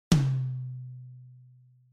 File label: tom mid; Tom Tom Drum: Mid